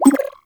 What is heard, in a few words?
Blessing Thank You Bubble, UI; Warframe; Blessing Device Menu